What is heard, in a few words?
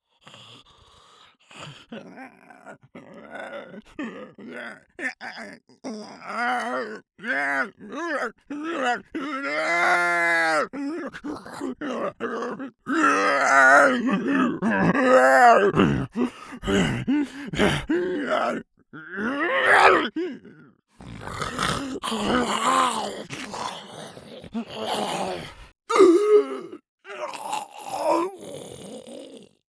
monster noises